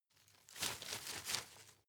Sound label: shake medium, foerster; berufe